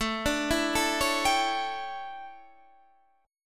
D Major 7th Suspended 2nd Suspended 4th, chord

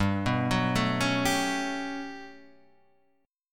G Major Sharp 11th G, Guitar Standard; GM#11 arpeggiated

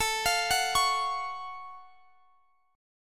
F sharp Minor Major 7th A

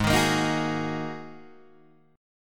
Ab 7th Suspended 2nd, Guitar, Open D

